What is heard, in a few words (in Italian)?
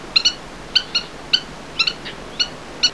Pavoncella armata, Uccelli; Suoni